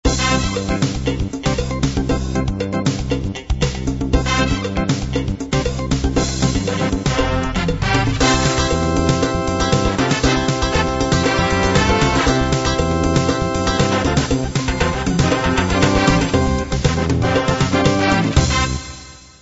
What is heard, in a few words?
fusion